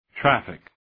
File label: traffic